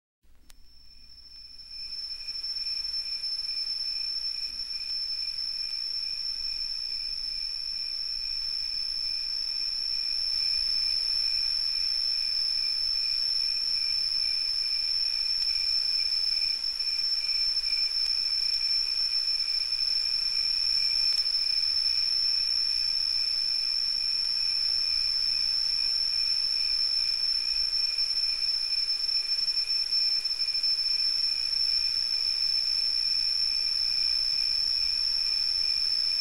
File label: crickets